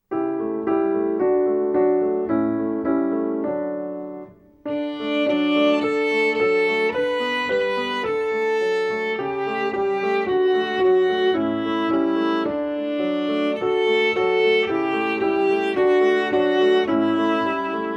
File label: twinkle violin